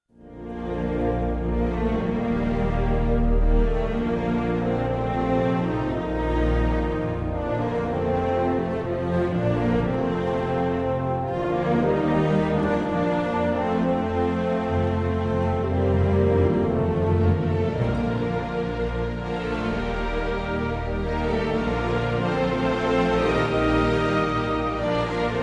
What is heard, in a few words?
homophony